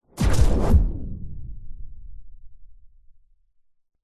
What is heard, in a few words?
science fiction weapon incoming bullets hit impact whoosh; zapsplat